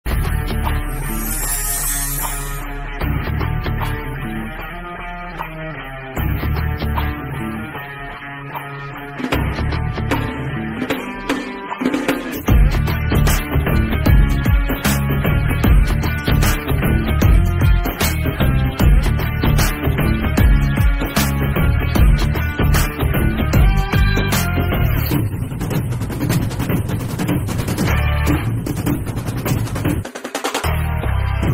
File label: Instrumental BGM Ringtone; Pushpa 2